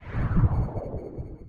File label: travelnet travel; Bucket Game; travelnet woosh; coderbuild